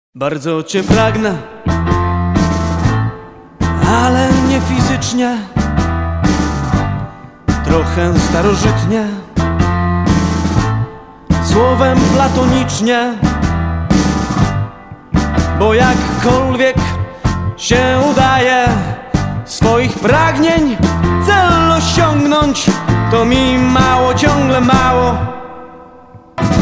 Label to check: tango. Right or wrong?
Right